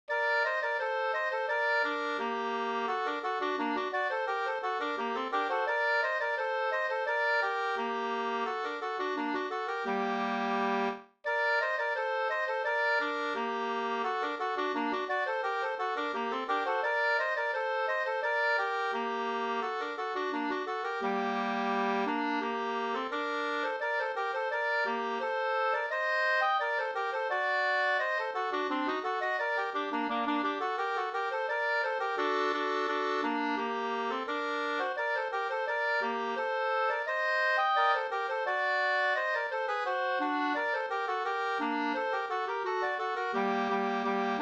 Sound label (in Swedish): ganglat Ganglat i gammal stil